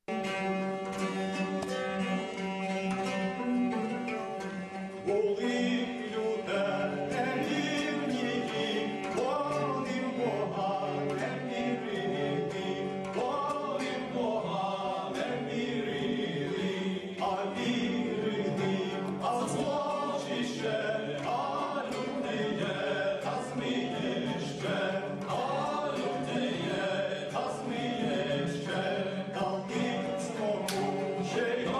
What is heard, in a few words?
Ukrainian baroque song